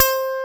EG MHHP C4, GUITAR; AKAI S-Series CD-ROM Sound Library VOL-8

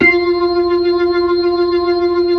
B-3, Blues, Keys of the 60s and 70s

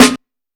Break Snare, Roland SH-32 Drumset

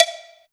COWBELL, Ragga & Dub Reggae, BELLS KIT; AKAI; USB Soundscan vol.27

